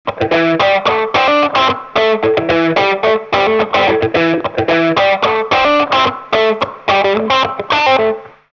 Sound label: Light Funk Rhythm, Guitar Effects Pedals; Pro Co